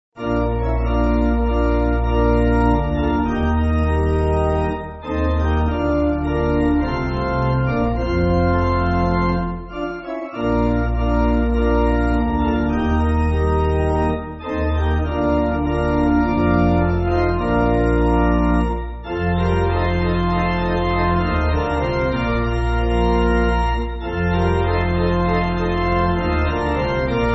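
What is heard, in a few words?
Pipe Organ, Free Music Backing Files for Churches; Jehovah Mighty Father; Kane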